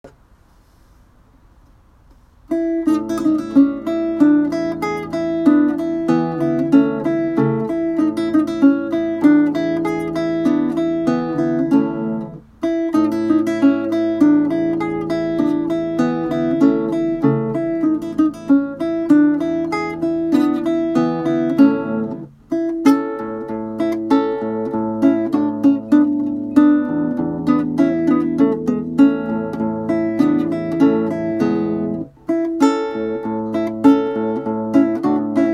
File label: Allegretto 19th guitar